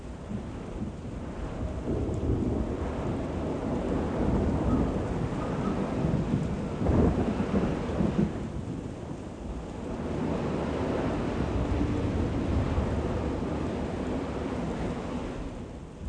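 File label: Monsoon Background; Platoon; Ambiances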